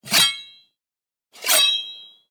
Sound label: knife sword shing; Sonniss GDC